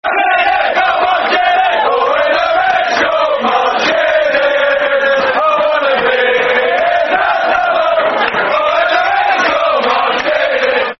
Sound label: When The Reds Go Marching In, Football Chants (Soccer Songs); Liverpool Lfc